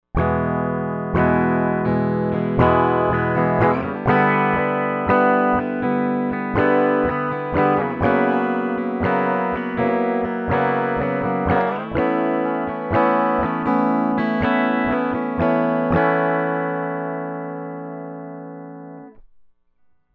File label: Epiphone SG dry